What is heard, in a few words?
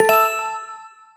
glimmer, notification-tones; BlackBerry OS-10; bbm